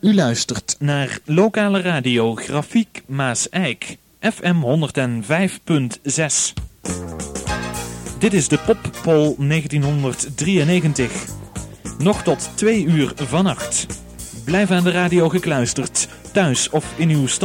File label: Jingle; Pop-Poll-uitzending; Pop-Poll 93